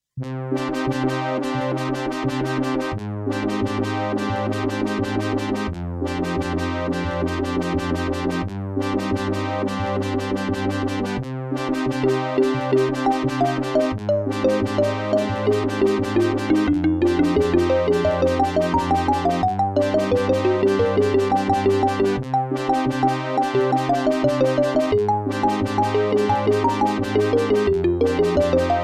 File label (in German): zwischenmusik